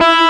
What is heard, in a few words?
E-3 1 OVER D, GUITAR; AKAI S-Series CD-ROM Sound Library VOL-8